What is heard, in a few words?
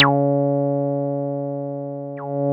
C4, synth; 90 s Sample CDs; NiceBeats; SynthLine VOL-29